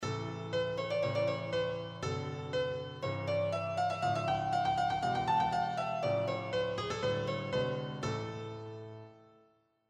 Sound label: new scale